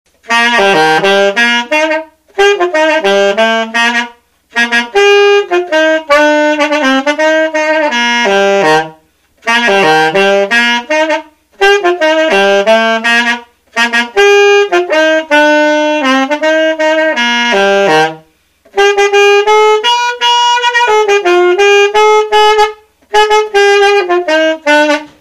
Olds Martin alto B flat; Sax

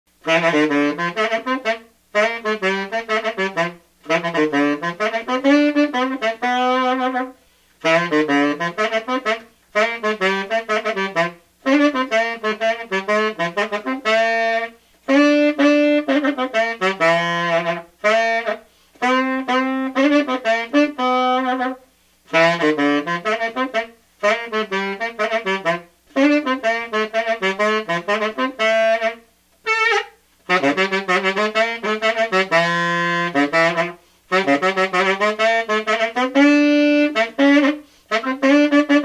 Buescher 400 Tenor

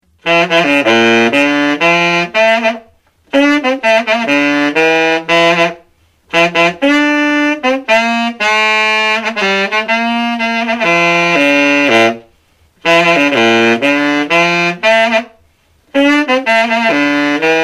Kohlert Winn Tenor, Sax

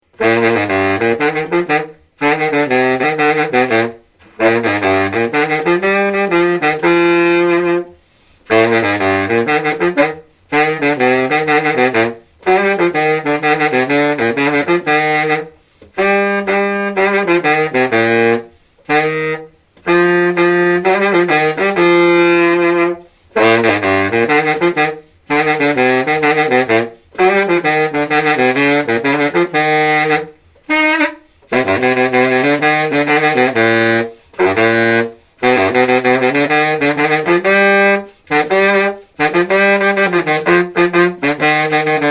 King Bari B flat

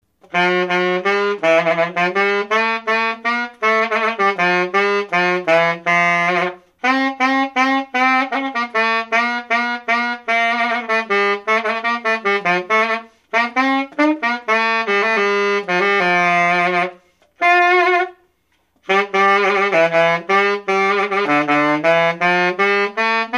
Conn C Straight Gold America C Key, Sax

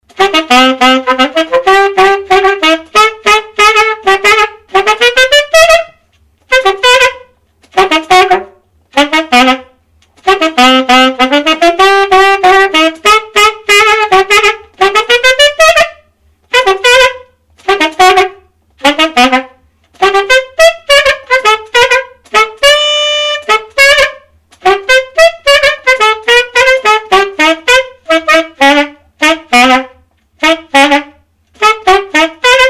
Buescher Silver Bee TT Alto Dixie

